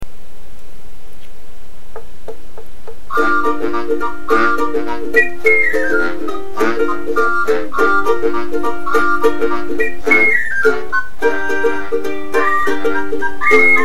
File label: Turkish March